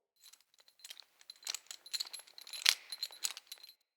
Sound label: Tent Ring Jingle, Interior; Soul Plane